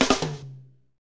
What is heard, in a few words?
FUNK; Northstar - Drumscapes Roland